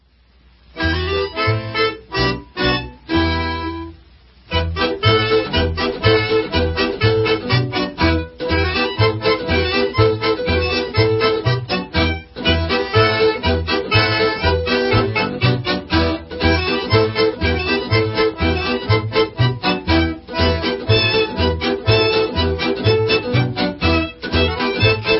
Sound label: Hora Debka Hora Folk Instrumental